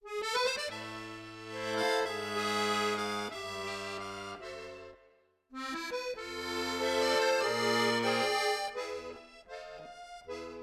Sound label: Accordion; legend of zelda the breath of the wild the champions ballad